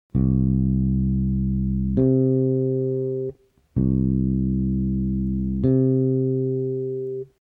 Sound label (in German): reine oktave